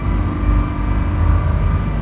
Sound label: ELEV